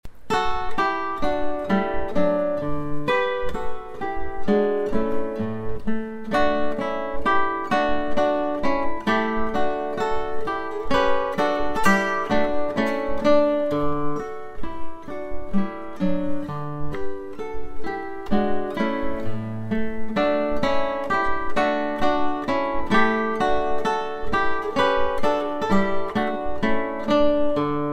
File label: menuet